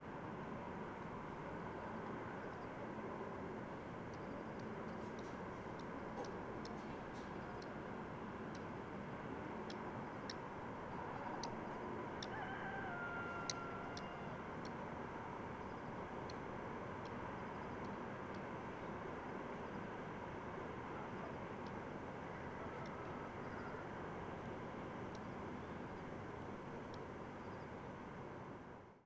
tek nfc

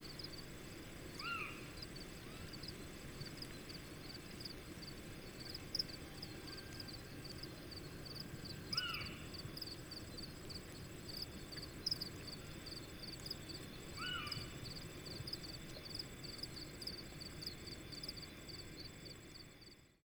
Arabian Scops Owl Excitement calls

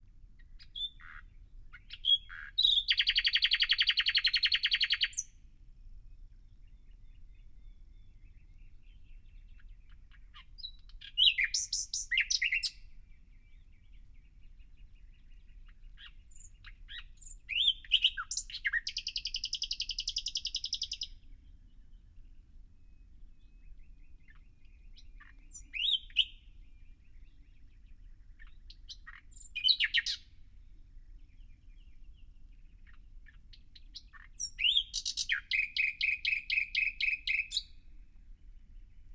Crystallised Song Of An Adult

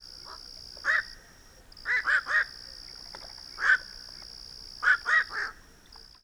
1st call

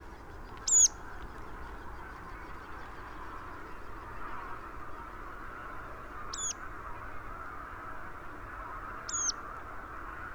Calls Of A Passing Migrant

